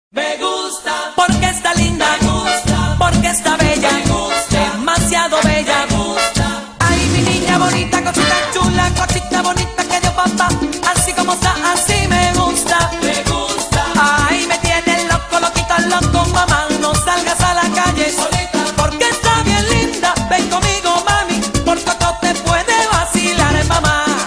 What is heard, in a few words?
merengue; Latin